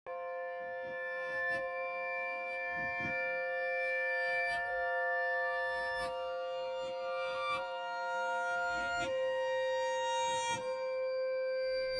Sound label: ambient